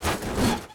COLLISION HIT LOW; SIMUZENSOUND

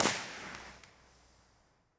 City Center, Hybrid Reverb; iZotope Hybrid Reverb

